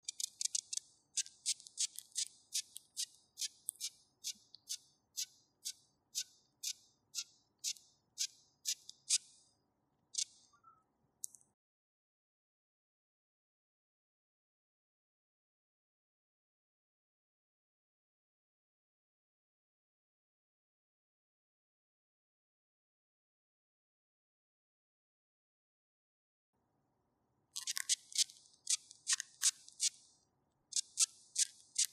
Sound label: southern pine beetle courting call; insect